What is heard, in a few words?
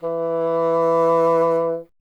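wind bassoon E; Miroslav Vitous - Solo Instruments VOL-1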